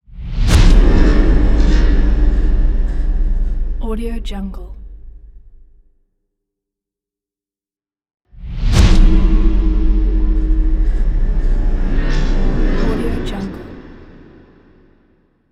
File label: industrial transition; anderington